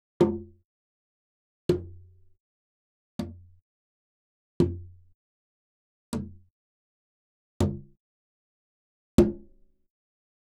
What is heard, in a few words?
Gamelan; Propeller Island - Complete Gamelan